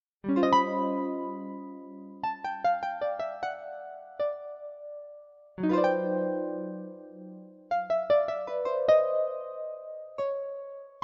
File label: Arpa